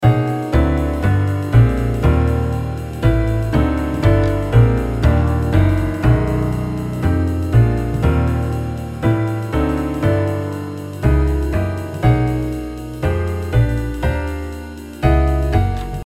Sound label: slow